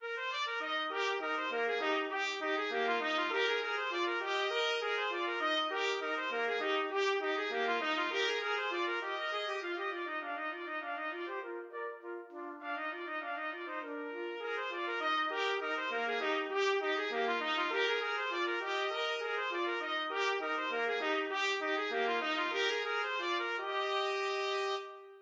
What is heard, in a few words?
seraglio duet trumpet